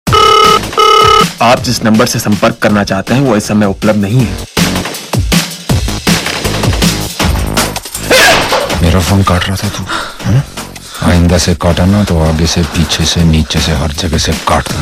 Phone Call; Jannat 2

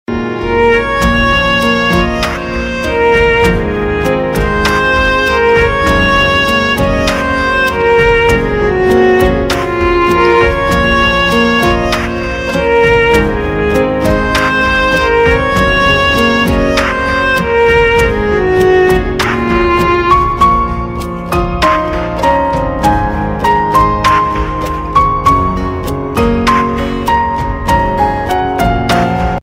Sad Violin

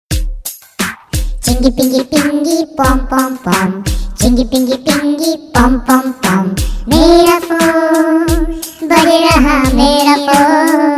Funny; Ringtones

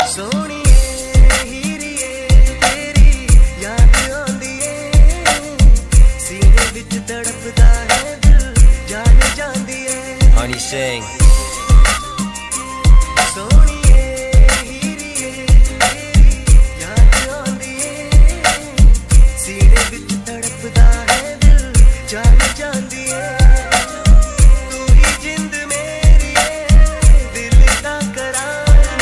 Hip Hop Mix, Bollywood Punjabi Pop

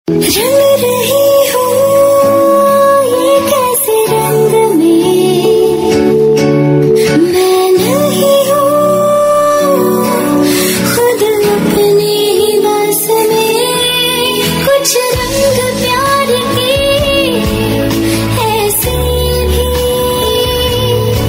Title Song - Female Version; TV Serial Tone; Sony; Kuch Rang Pyar Ke Aise Bhi

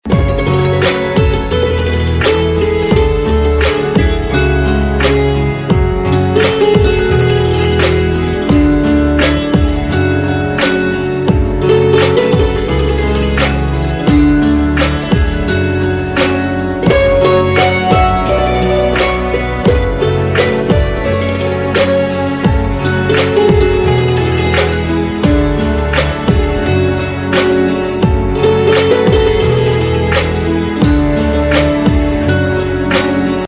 Santoor Mix Instrumental Ringtone; The Xpose; Bollywood Hits (2014)

